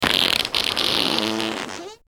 long farting contest

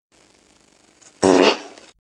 funny fart in car

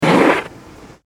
sudden fart at party

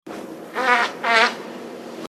farting contest at party

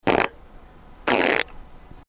fart during sermon

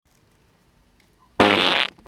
sudden fart in office

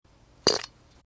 stinky fart in bathroom

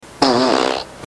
sudden fart explosion

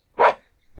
loud farting dog

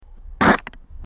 loud fart in public

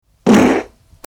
squeaky fart on plane

